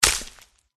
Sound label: attack contact middle